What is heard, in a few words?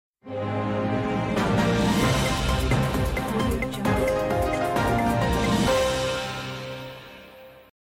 news intro